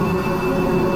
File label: iron stylus; LoL; items